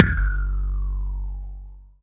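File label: bass drum, right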